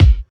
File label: clear kick single hit B key